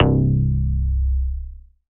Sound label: Single Electric Basses, Dance Floor Bass